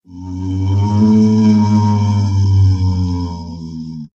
wraith; minecraft; technic

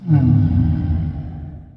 ogre dying; minecraft; technic

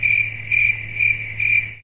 critter, wildlife; minecraft; technic

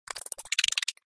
scorpion grunt; minecraft; technic

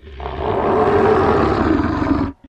werewolf hurt; minecraft; technic

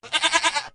goat grunt; minecraft; technic

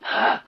wraith hurt; minecraft; technic

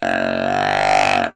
deer grunt; minecraft; technic

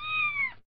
kitten hurt; minecraft; technic

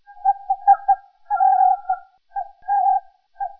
fox call; minecraft; technic

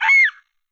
fox hurt; minecraft; technic

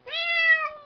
kitty food; minecraft; technic

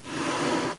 kitty upset; minecraft; technic

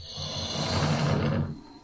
croc resting; minecraft; technic

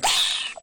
rabbit hurt; minecraft; technic

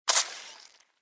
scorpion hurt; minecraft; technic

